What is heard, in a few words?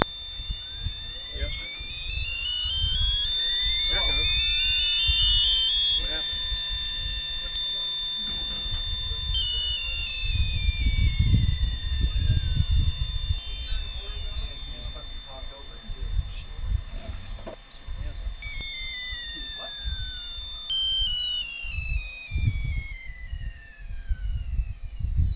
RA motor